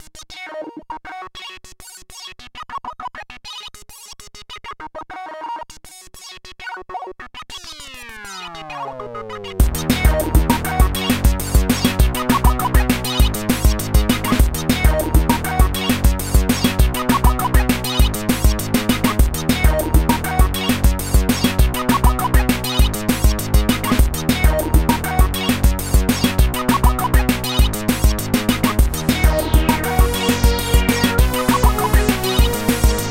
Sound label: megableep